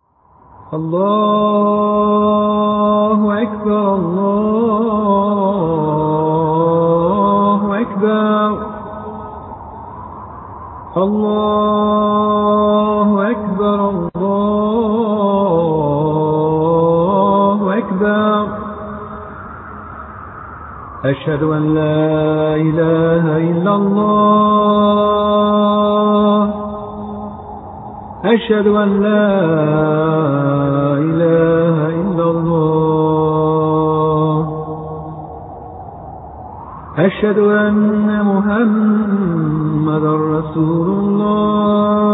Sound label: Azan call to pray